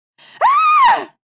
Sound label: female scream